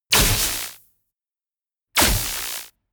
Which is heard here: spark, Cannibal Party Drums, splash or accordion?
spark